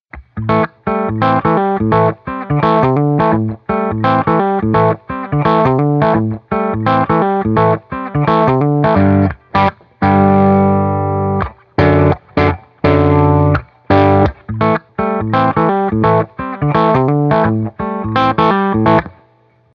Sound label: bogner Duende chan A